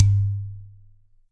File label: Udu High